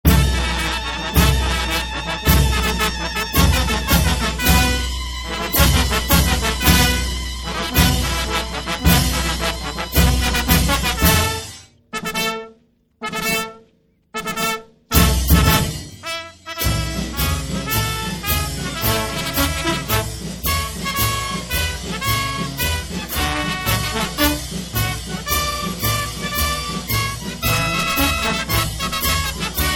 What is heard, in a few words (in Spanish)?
himno musica